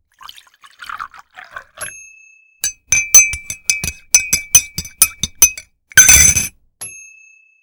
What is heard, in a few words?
coffee cup